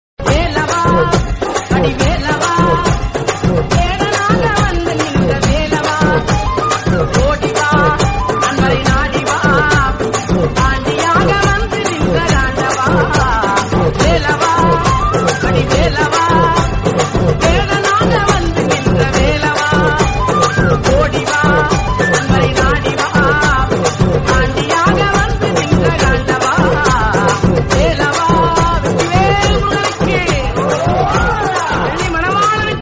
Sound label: devotional tamil song